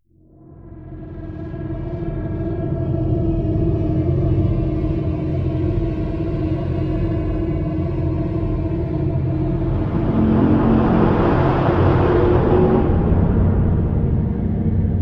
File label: ambient